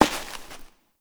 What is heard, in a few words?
Step Ice; Warframe; Koumei